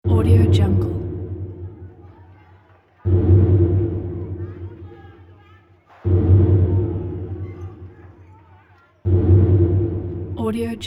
halloween horror soundscape

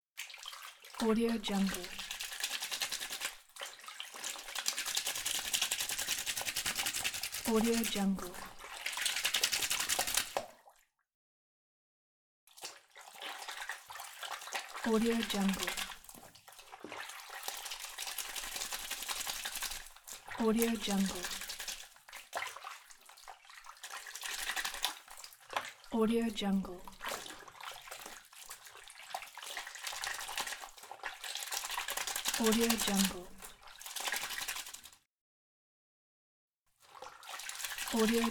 hand wash clothes

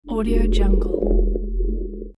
low grumble by; sound ideas

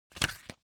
paper magazine flip forward fast; foley; smartsoundfx

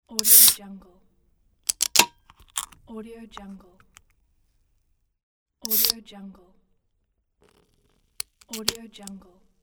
open beer can

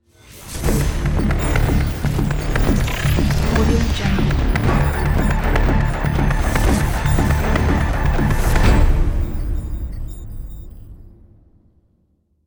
news report logo; nasoundtracks

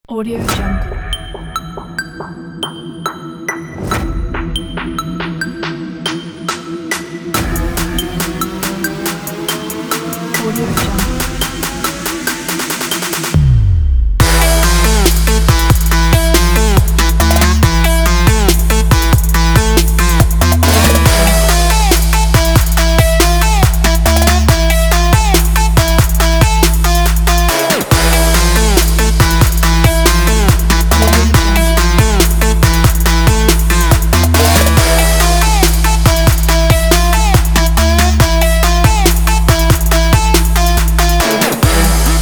trap energy

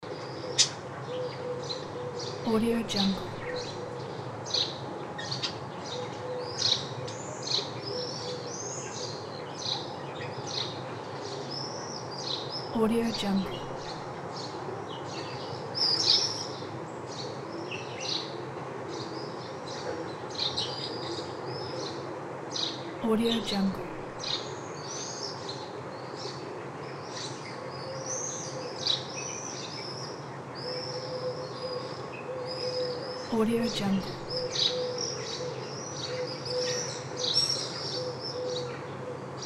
birds in morning town; soundfood

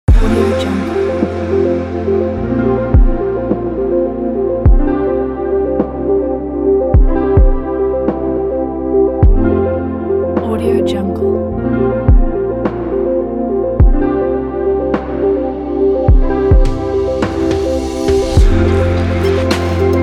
lofi chill

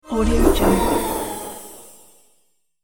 magic spell whoosh curse

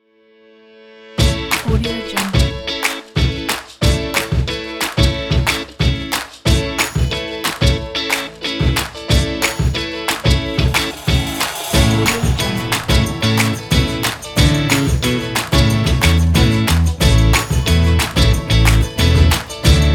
happy upbeat

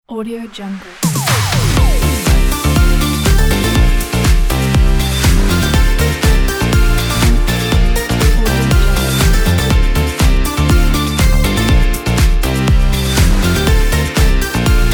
tv show opener logo

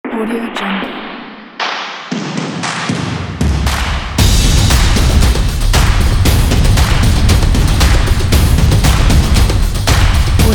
stomp clap logo

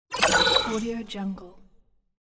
teleport

